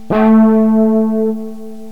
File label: Chiffer Lead; yamahaxg; synth lead